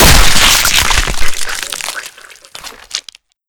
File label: explode